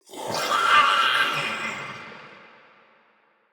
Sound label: Death, Barks, Battle, Soldier; Mendicant